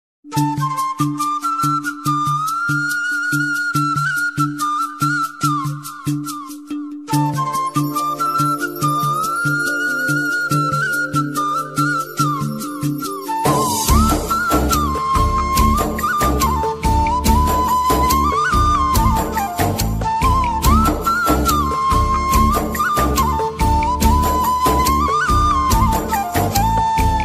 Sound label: flute music bgm ringtone telugu